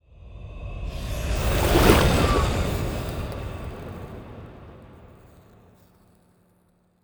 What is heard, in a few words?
ship titan move; Stellaris; toxoids; ships